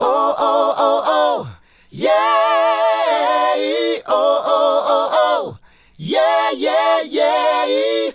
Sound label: Male Group, Hip-Hop and RnB Vocals